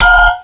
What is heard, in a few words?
cond switch off